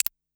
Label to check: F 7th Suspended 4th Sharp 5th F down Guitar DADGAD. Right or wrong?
Wrong